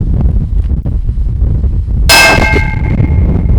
Thunk guardrail; fieldrecordings

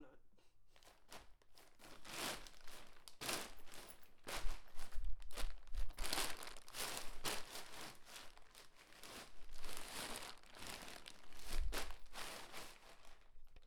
Crumpling Plastic Bag With Foot; West Hall

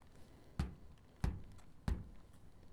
kicking dumpster more

